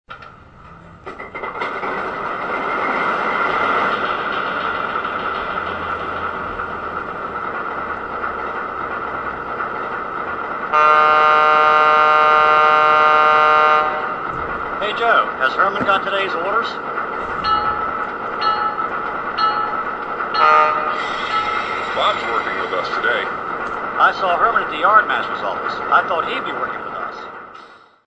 Alco FA-2, trains

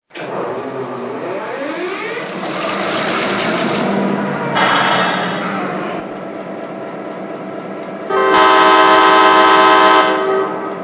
MP15AC

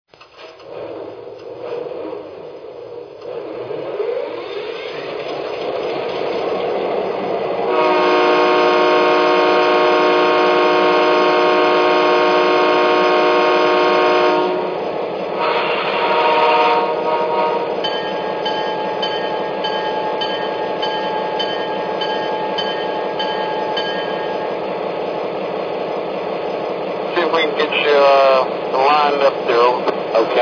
GP38 2

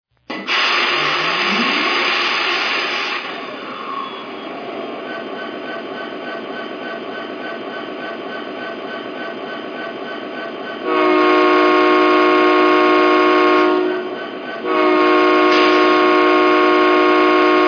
SD90MAC

